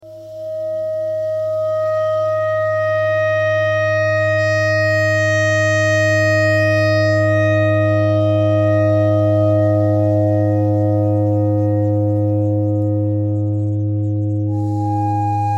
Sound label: clarinet notes e clarinet thread noise